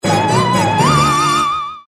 jingle; Clash of Clans

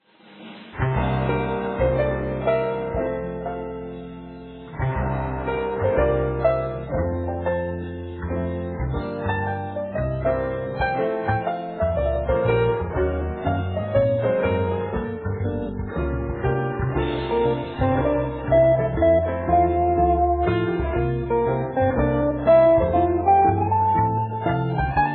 Ocean Voyage

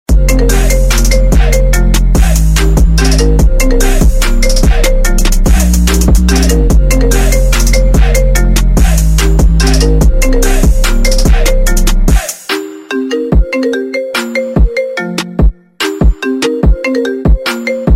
iphone 8 Original Ringtone

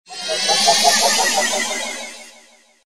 sms; Clash of Clans

